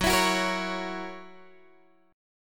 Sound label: Gb 7th Gb down Guitar Open G, chord